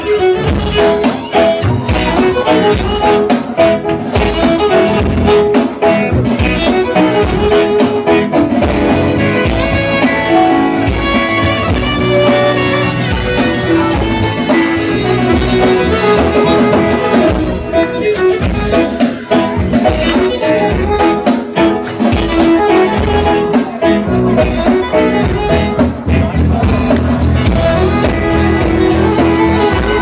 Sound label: violin ringtone